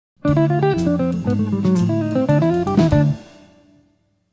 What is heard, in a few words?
Melodic Line